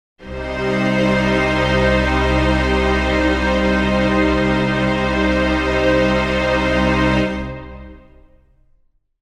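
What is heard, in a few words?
string chord c minor, Pads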